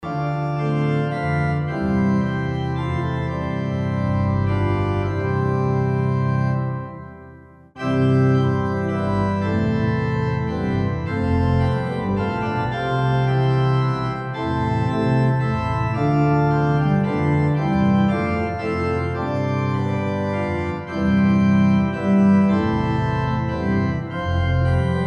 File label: Hymn organ; Holy Spirit ever living; Abbots Leigh